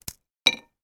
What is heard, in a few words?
food biscuit cookie snap drop onto plate; sound effects one